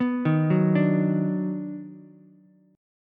D sharp Minor Major 7th Sharp 5th D sharp down Ukulele; arpeggiated; chord